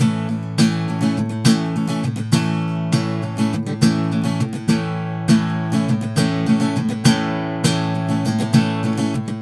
Pop Ac-Guitar Strum Amaj; 102; Pop Rock; Acoustic Guitar; Pro Tools Essential Loops; Digidesign

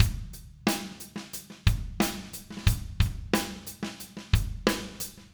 090 Alt Rock Drums Verse; Pop Rock; Moderate Tempo; Pro Tools Essential Loops; Digidesign

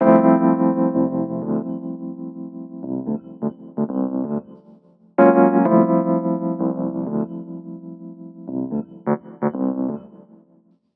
085 HipHop MarkII Dmaj, E-Piano; Pro Tools Essential Loops; Digidesign

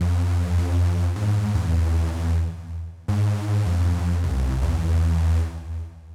Techno Bass Fmin; 156; Dance DJ; Digidesign; Pro Tools; Pro Tools Essential Loops

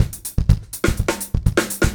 123 Pop Drum Drive, Fast Tempo; Pop Rock; Drums; Digidesign; Pro Tools; Pro Tools Essential Loops

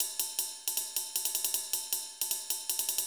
Techno Ride; Dance DJ; Drums; Cymbals; Digidesign; Pro Tools; Pro Tools Essential Loops

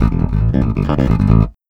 Country Bass Ending; Digidesign; Pro Tools Essential Loops

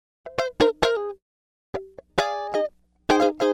133 Pop Guitar Funky F maj, Electric; Pop Rock; Electric 100-160 bpm; Pro Tools Essential Loops; Digidesign

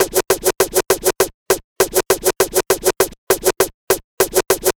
Vinyl Scratch Snare

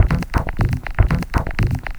120 Dance E-Perc; Percussion; Pro Tools Essential Loops; Digidesign

